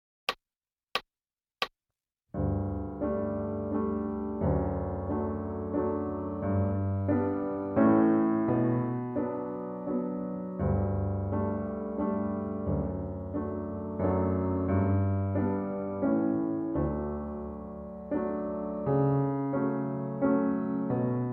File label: Piano, Waltz